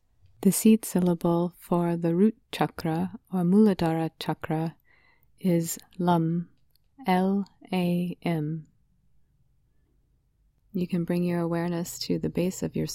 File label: Bija Mantra Root Chakra LAM